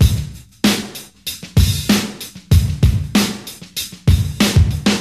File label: 96 bpm drum loop d sharp key